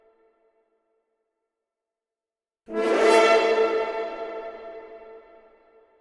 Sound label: Horns fx; brass